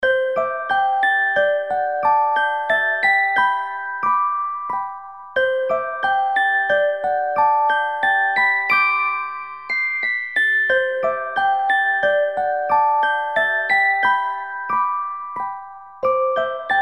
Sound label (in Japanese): おやすみオルゴール